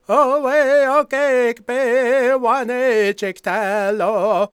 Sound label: C# MIN, World Voices, LAKOTA